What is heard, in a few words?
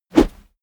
whoosh heavy fast; swooshes-swishes